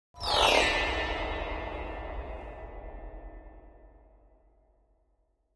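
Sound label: Grand Piano Strings High; keyboards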